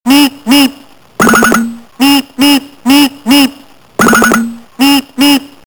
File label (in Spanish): correcaminos